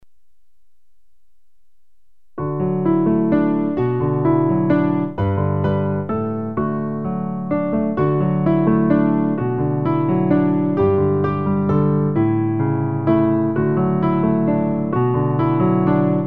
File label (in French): piano; recueil Louez Dieu